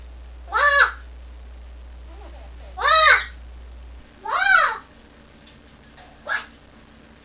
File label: calling MOM